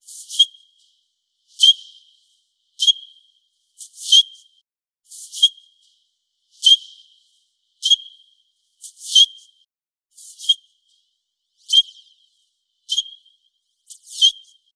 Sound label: Squeak Only, Scratchy